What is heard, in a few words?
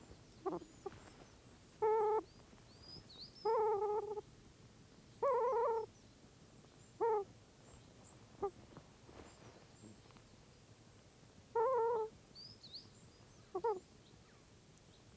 Osprey, Pandion haliaetus; raptors